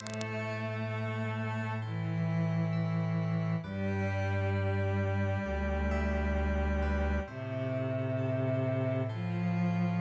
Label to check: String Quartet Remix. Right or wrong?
Right